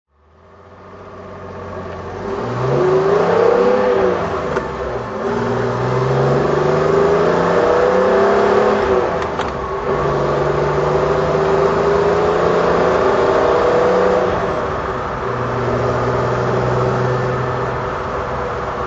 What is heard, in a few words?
230sl drive